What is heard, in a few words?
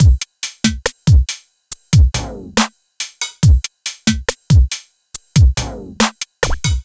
Slomo Beat 140 BPM, Loops, Slow Motion Beats